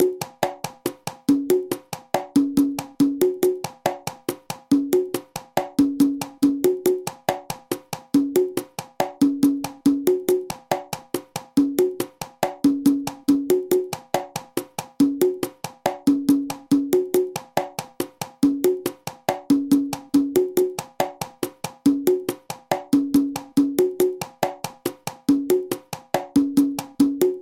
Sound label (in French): guaracha conga lent